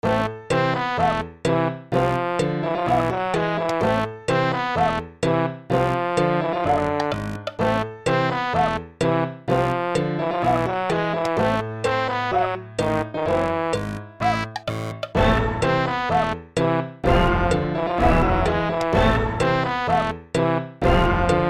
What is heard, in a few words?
Boring Room; Golden Soul: Dead Hopes; android gamerip 2020